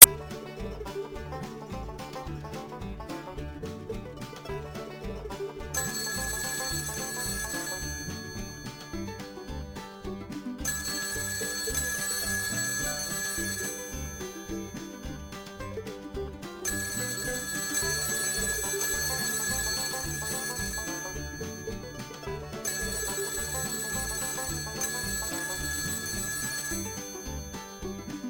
country tone

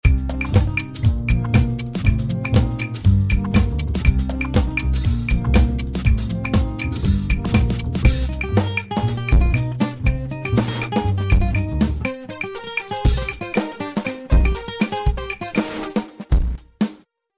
garage band; Latin

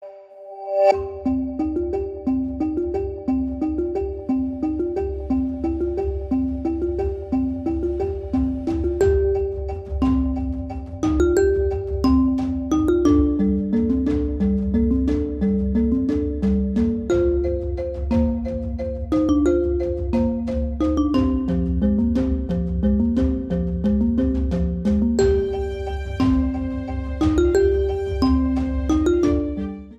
marimba remix ringtone

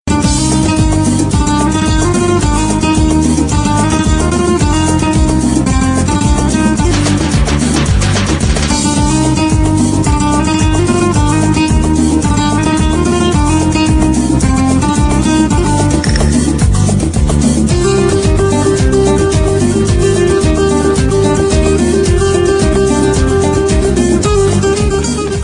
persa flamenca; Latin

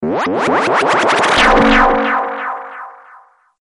funny tone; Airtel 3D

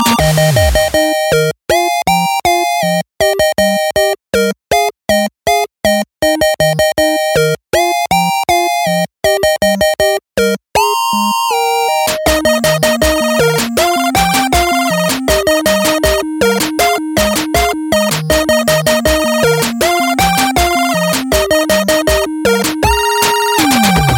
smile 8bit

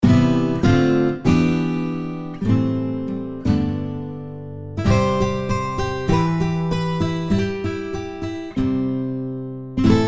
world guitar

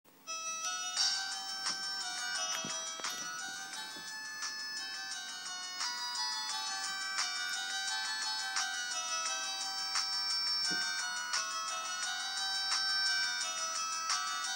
samsung violin; Electronica

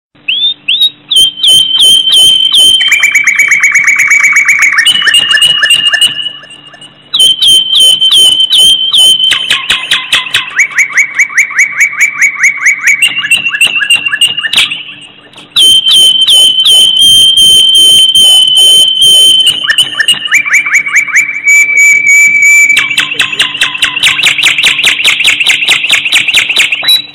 cute bird; Animals

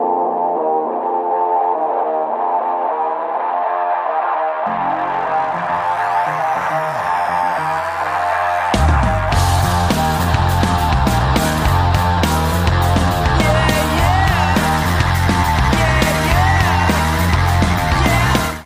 Theme Ringtone; Entourage

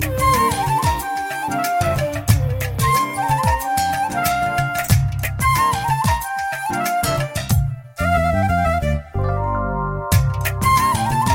classical ringtone

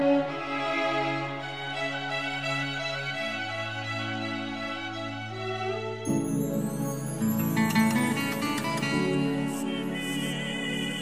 instrumental cool music; Electronica

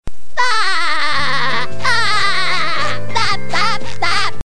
laugh; Spongebob

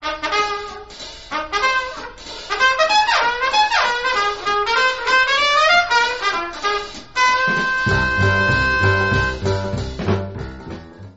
bugle boogie; Jazz

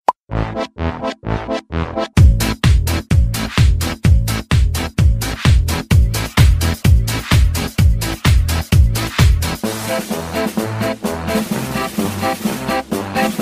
instr

